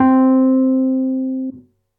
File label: Basic Wurly DI, Wurly piano; Electric Keyboards; Ableton; Factory Packs